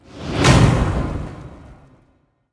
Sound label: infernal wheel; lilith